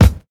clear kick single shot c sharp key, kick drum

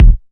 nice bass drum sample d sharp key; kick drum samples

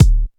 mid heavy kick d key

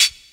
dark pedal hi hat c sharp key; hits one shots; hat samples

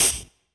perfect pedal hi hat single hit c key; tuned drums

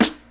90s hip hop steel snare drum sample d sharp key

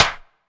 sparkly snare g key

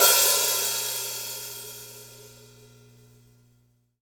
long room reverb open hat sample d sharp key

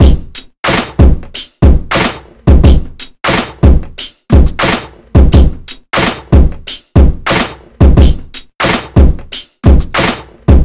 90 bpm drum loop C# key

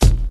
trebly rap bass drum single shot e key

